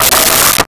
Wood 2x4; Tools; 1001 Sound Effects

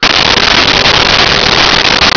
chorus

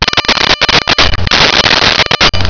alarm loop

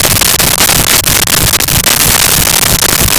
Large Industrial Fan Loop

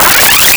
Arcade Alarm Loop; Arcade and Games

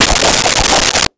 Swirl; Comical Effects; 1001 Sound Effects

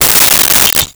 Lightbulb Break; Crashes; 1001 Sound Effects

